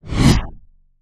Swell Flanged; bottlerocket

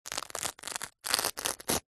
luggage bag fabric small velcro fastener rip

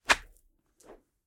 Swoosh Rope Whip; Just Whoosh 3; soundbits

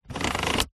car temperature dial turn clicks toyota rav4; vehicles

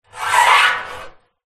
metal rusty pole scrape on metal oil drum; foley

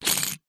foley bottle lid twist spin open or close; zapsplat

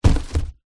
foley cardboard drop lightly

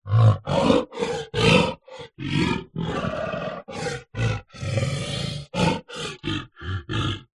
animals gorilla vocalisations

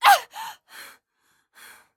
Humans Female shout of pain; Screams Shouts 2; soundbits

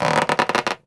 foley balloon rubbing squeak; sound effects one

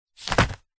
wrapping paper roll set down; zapsplat; foley

